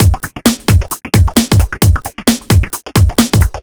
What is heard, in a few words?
132 No Hats, Drum Loops; Zero-G; Soundclash Nu Dance Electro; Acidized Wavs; 132bpm